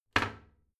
toilet seat slam soft; haus-garten; badezimmer

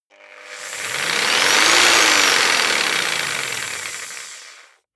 jigsaw engine medium fast medium length; maschinen; saege; soundpool

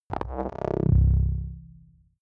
click ready boot low, signale

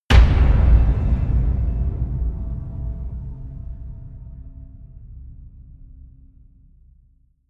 impact hit; whooshes rises

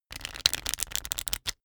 pack of cards shuffle table

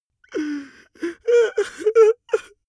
male crying; soundpool

